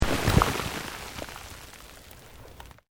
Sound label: Earth Loose Move Short; Material components for constructing more complex sounds; Debris; Materials; SoundEffects Foundation2